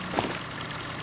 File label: draw, weapons; cso; turbulent3